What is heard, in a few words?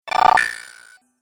Cartoon Game Spell Charger, Magic Sound Effects